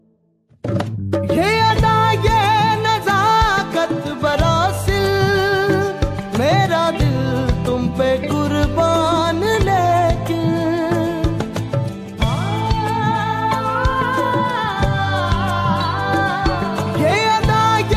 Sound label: Hindi Sad Song Ringtone